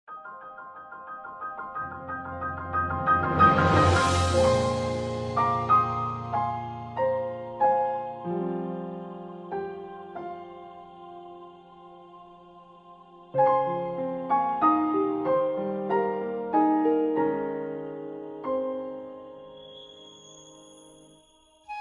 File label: music; zelda parallax